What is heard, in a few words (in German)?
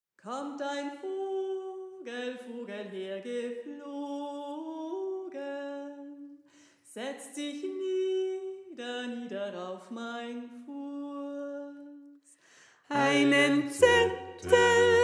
Sopran; Kommt Ein Vogel Hergeflogen